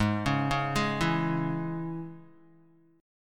G sharp Suspended 4th Sharp 5th, G sharp, down, Guitar; G#sus4#5; arpeggiated; JGuitar